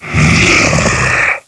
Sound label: Scrake Death